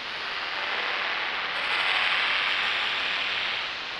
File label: sparse soundscape samples; ReLooped